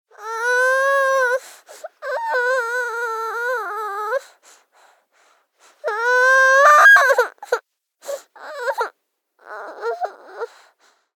BABIES WEEP Girl 24 months Tired; Baby Boom

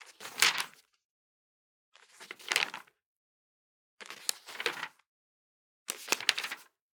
Organic Paper Page Turn; boom library; The Interface

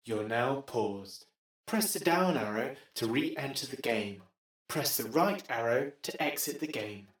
Paused; Pitch Black Audio Pong

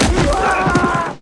Football Hit Tackle

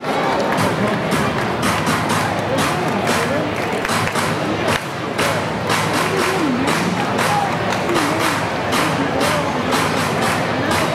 Baseball Organ Crowd Claps; SFX Kit; Crowds

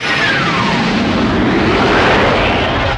Superheating Pre Explosion; Destruction

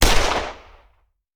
Hand Gun; Sound Ideas; Guns

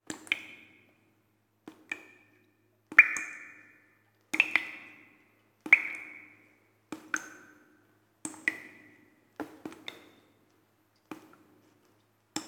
Cave Water Drops, Ambience; iLife Sound Effects

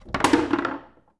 Foosball Goal Score

